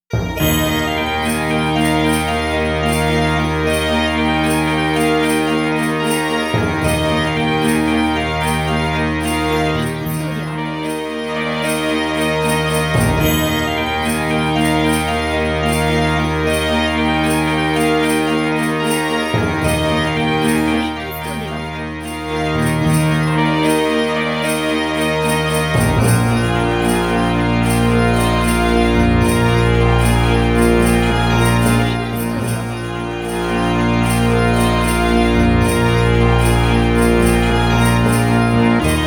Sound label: Celtic dance; Ethnic